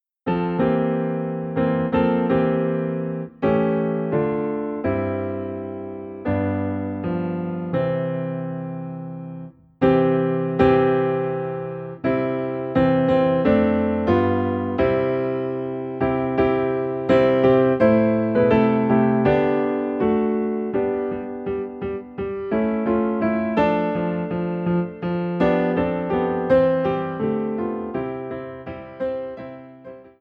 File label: Piano in C